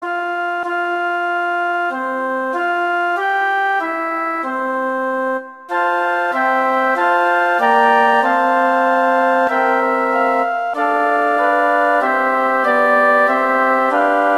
O Come All Ye Faithful - Adeste Fideles, Christmas Flute